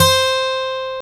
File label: Gtr Acc; CMI